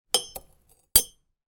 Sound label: household teaspoon place in coffee mug with instant coffee granuals inside